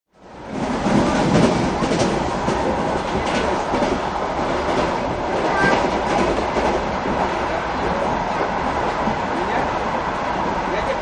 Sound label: minsk, world trains